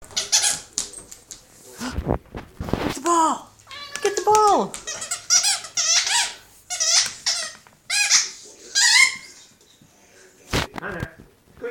squeak

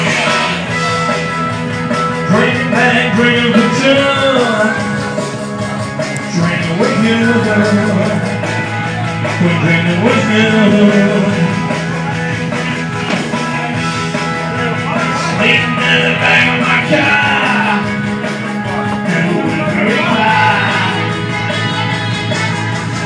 singing

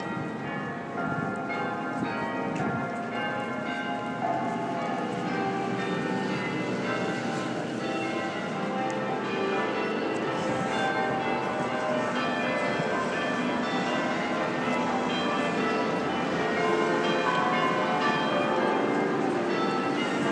bells; tower hill

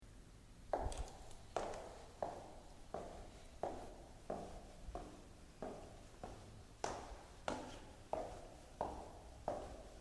walking down the stairs at hotel; regina vienna austria

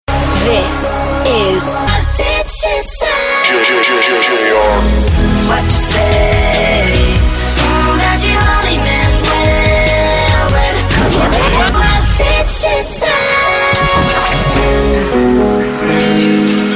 long beat mix

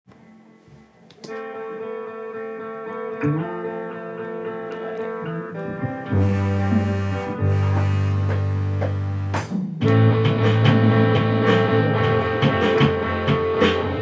drum peice

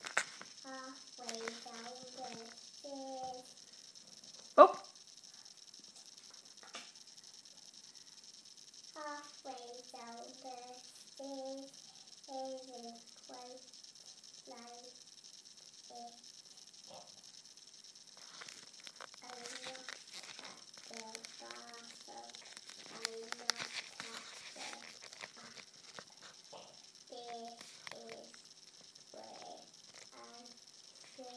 small girl sings

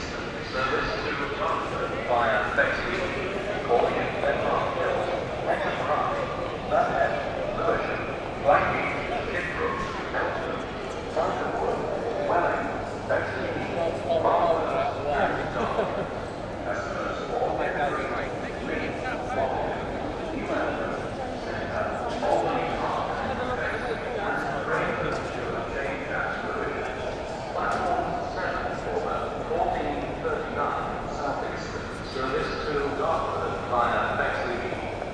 london victoria railway station

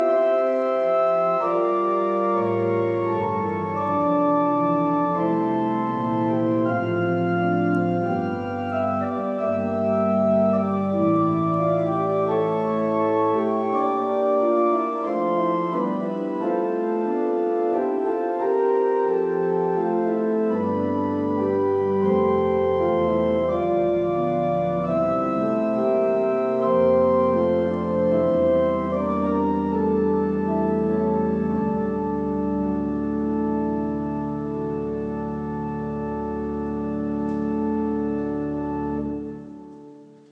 large organ

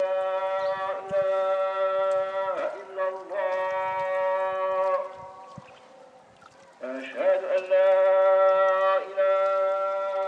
call to prayer, marrakech

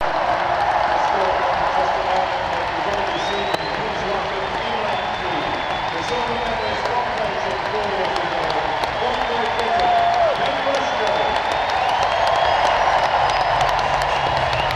crowd noise at the olympic stadium

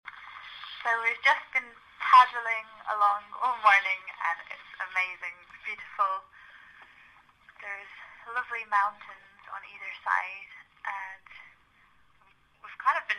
paddle happy with a guitar

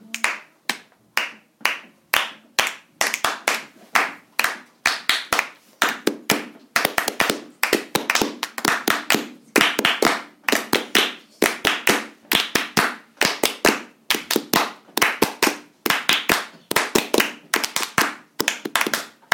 clapping piece

